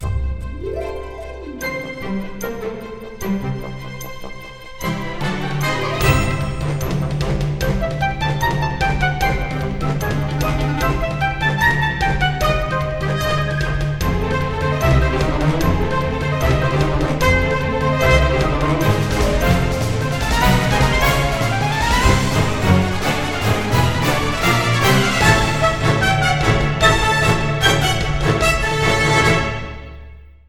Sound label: Disney style Orchestral